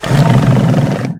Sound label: snort